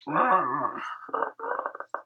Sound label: Leg Eater Alert